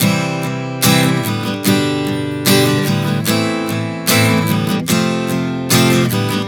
Progression 074 C-G-Am-F, Acoustic Guitar, Chord Progressions 074 bpm; Sonic Foundry; Songwriter Acoustic Guitar Companion